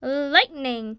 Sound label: lightning; The Interactive Alphabet